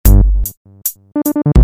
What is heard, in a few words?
Loop